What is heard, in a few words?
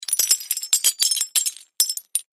Wood Tile Sml Squares, debris